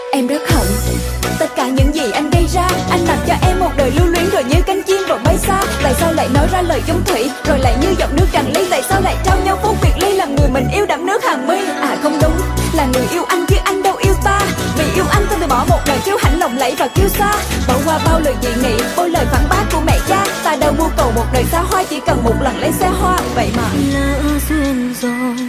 Nhac Chuong RAP

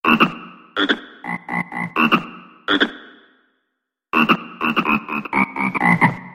tieng dv cucaracha frog

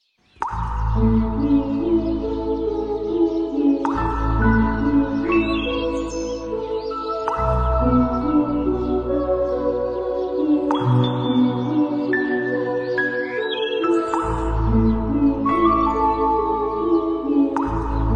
Nhac Chuong Samsung Birdsong By The Lake

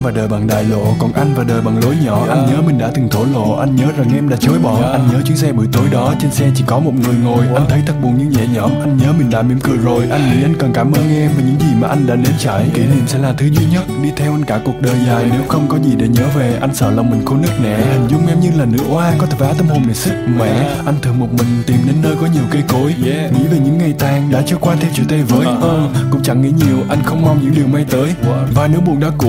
nhac chuong loi nho rap

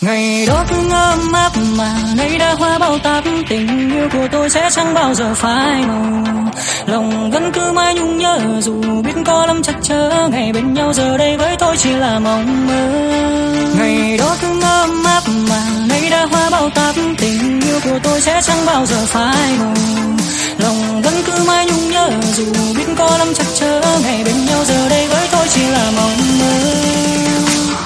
Nhac Chuong Remix

